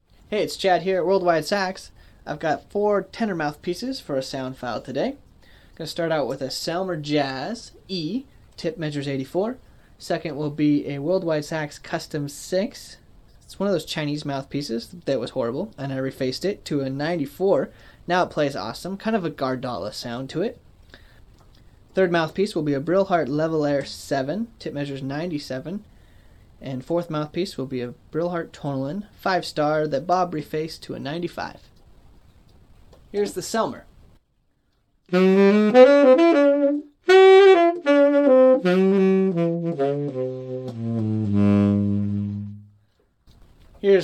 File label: Tenor Selmer